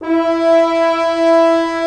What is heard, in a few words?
WINDS & BRASS